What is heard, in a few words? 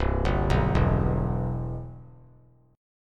C Major 7th, chord; CM7 arpeggiated; JGuitar